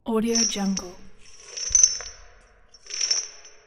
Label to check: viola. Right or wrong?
Wrong